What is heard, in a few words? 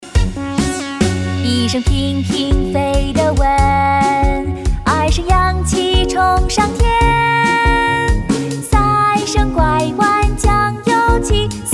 female childrens song tone